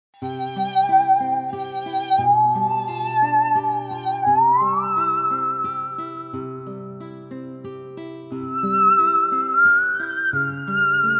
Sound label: Saw